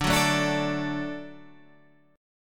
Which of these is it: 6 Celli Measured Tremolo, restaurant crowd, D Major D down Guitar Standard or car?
D Major D down Guitar Standard